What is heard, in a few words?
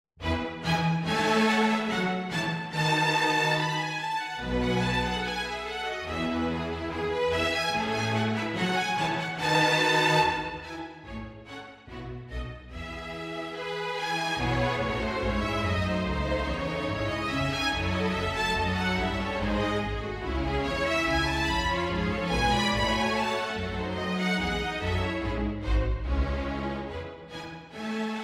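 Bach Gavotte string orchestra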